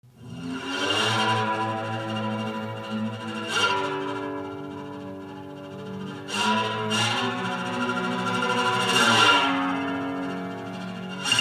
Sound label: Lonely Cowboy Guitar Theme